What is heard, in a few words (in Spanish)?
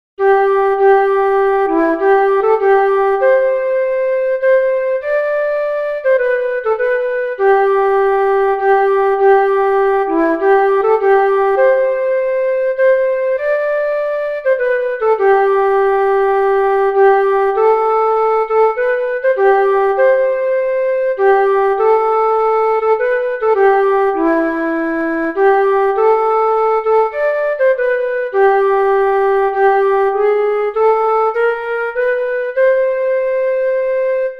flauta